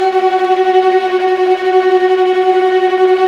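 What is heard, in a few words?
STR Viola Tremolo; Roland LCDP08 Symphony Orchestra; STR Vas Bow FX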